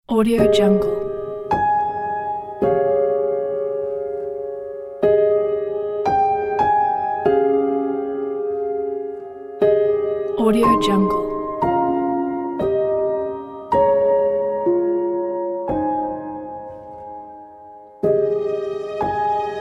christmas lyrical piano tune

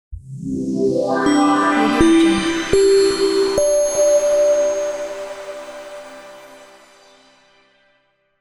atmospheric logo

